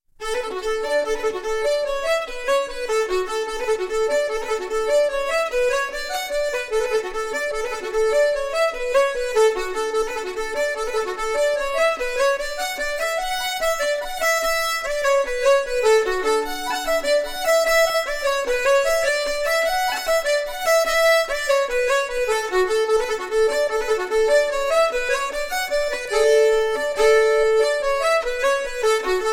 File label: Polkas; SliabhNotes